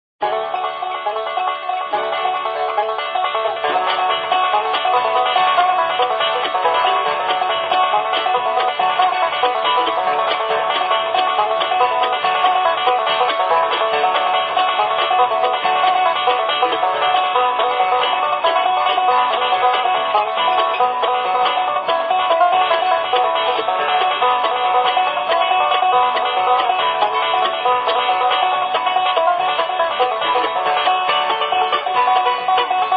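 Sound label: Music Ambient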